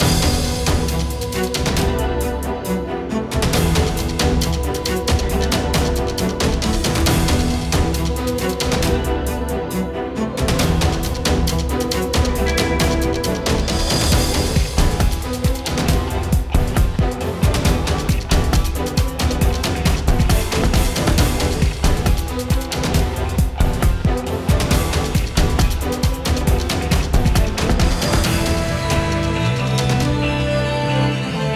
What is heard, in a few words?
BGM Boss Battle; starbites